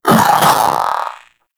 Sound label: Zanuka Companion Vocal Bark Double; Pets; Warframe